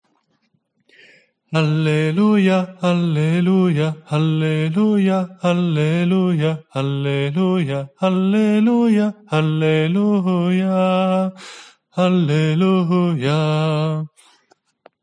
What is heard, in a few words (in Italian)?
alleluja date lode ritornello voce principale, coro